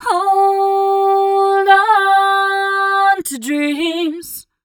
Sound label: f mel d, fem Vocals